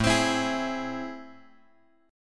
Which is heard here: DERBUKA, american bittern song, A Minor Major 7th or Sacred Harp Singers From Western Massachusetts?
A Minor Major 7th